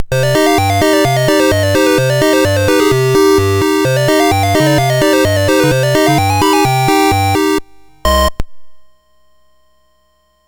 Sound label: Dragonworld sid MOS6581R4